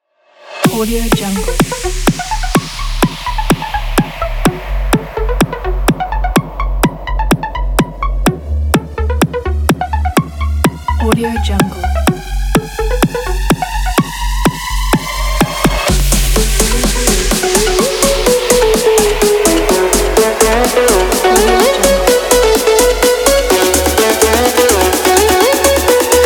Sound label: energy edm; tatolix